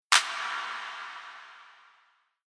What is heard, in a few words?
Reverb Delay Basic Clap Rev On